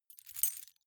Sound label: key ring large rattle air soft; objekte; schluessel